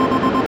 Sound Slot Machine Symbol Replacement

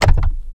Door Locked

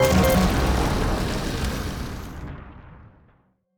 Slot Machine Scored 666

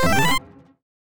Sound Terminal Offer Ad

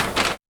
Sound Menu Map

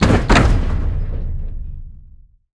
Sound Door Open

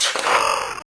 Powerup Clover Pet Trigger

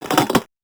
Powerup Put In Drawer

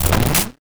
Car Battery Trigger

